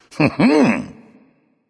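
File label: "heh heh", nonverbal; smite; voicepack; khepri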